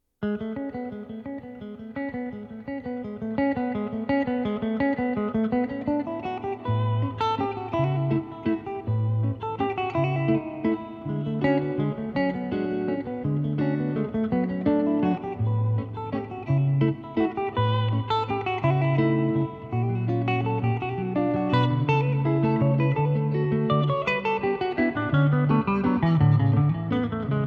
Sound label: two guitars